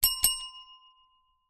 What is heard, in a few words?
bell service desk press x2